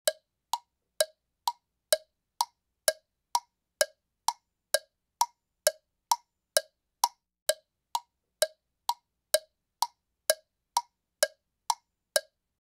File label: Clock